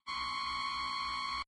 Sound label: Time Warning; john carter; lost symbol